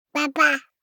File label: BABIES TALK Boy 12 months Baba; Baby Boom